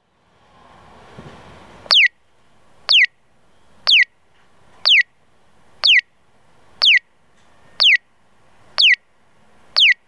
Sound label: piyo; tottori; tomiyasu 2chome; nagoya M-GH-1